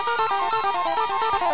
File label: breathless; beeps